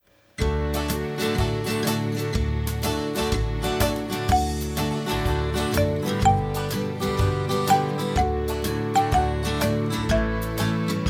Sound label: karaoke; goodbye